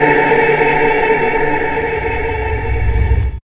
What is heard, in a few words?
CHOIR; X-Static Goldmine 1